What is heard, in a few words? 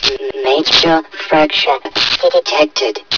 zombie idle